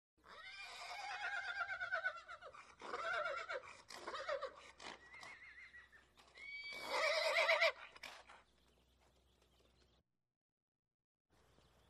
CRIES VARIETY OF STALLION CRIES, wildlife sounds

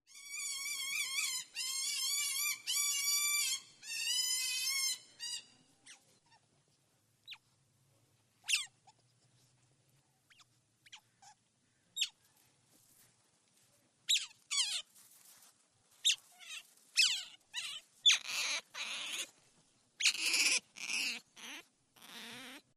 OTTER ASIAN DISTANT TO CLOSE CRIES PEEPS; wildlife sounds

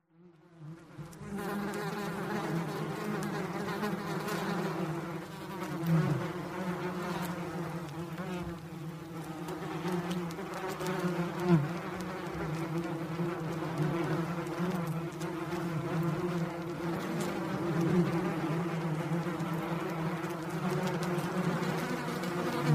YELLOWJACKETS INTENSE BUZZING; wildlife sounds

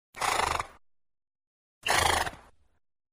LIPS BLOWING THROUGH FLAPPY LIPS; wildlife sounds

